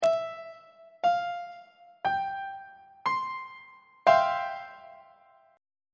f major 7th suspended 2nd 3rd inversion; on treble clef

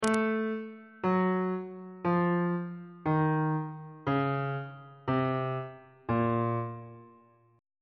a sharp harmonic minor scale descending

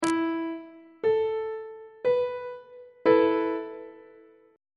f flat suspended 4th chord; on treble clef

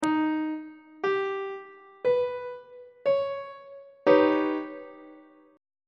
d sharp augmented 7th chord on treble clef

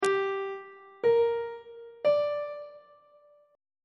c dorian chord 5 is g minor on treble clef

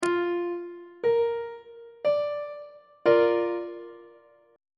b flat major 2nd inversion on treble clef

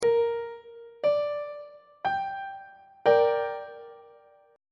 f double sharp minor 1st inversion on treble clef

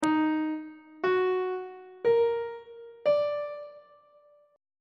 e flat minor major 7th chord on treble clef

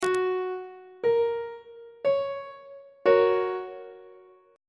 g flat major chord on treble clef

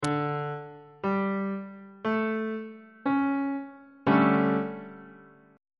d major 7th suspended 4th chord on bass clef

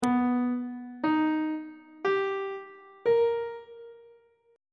c flat augmented major 7th chord intervals; on treble clef

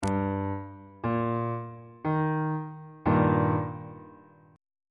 e flat major 1st inversion on bass clef

